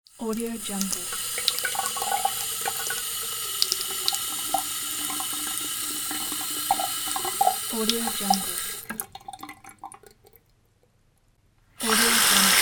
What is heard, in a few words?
tap water